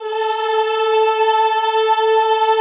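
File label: Choir A3, Ehh; Eeh + Ehh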